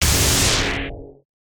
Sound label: dark magic middle; 魔法/法术的音效